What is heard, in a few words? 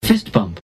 fist bump; baymax